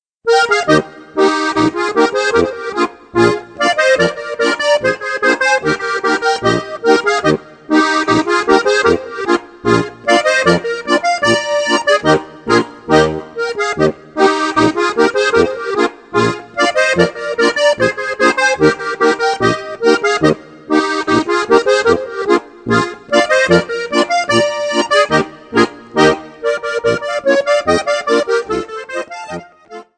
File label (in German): polka